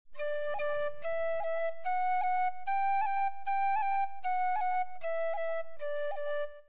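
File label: cuts, Tin-Whistle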